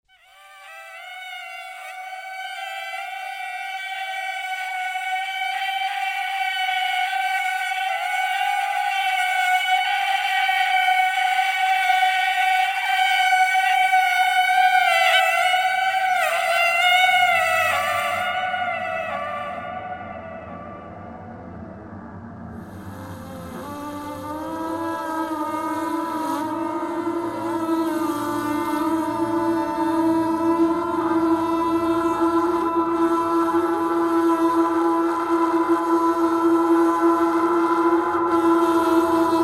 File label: KANGLING; The Sound of Death; Strix Instruments LLC